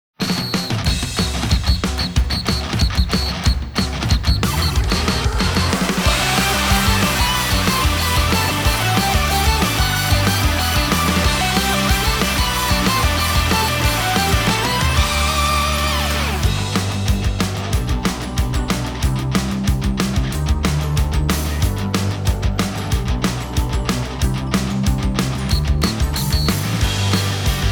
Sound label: Instrumental; Destiny Child